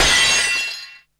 Smash, cartoon Foley Fx; musicradar; cartoon caper

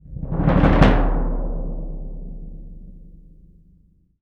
BS DRM; ILIO; Synclavier Percussion 2; World & Orchestral

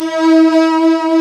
STRG A3; East West; Global Sounds

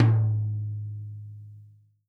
tom, Real Drum Kit, funk kit

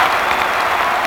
CROWD SAMPLE; E-MU Formula 4000 Series Vol. 5; Protozoa; Phatt Instrmt

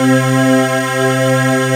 NIGHTMARE, ROLAND D-50; Rubber Chicken Software Sample Bank

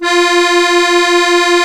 musette F3, accordion

